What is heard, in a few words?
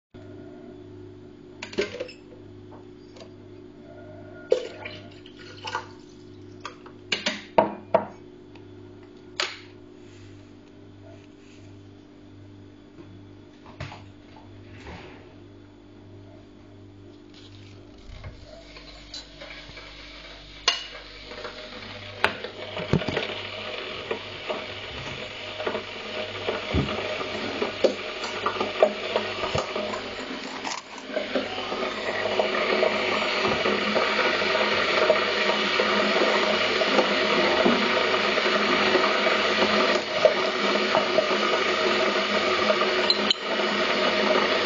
coffee at 530am; ssemyonov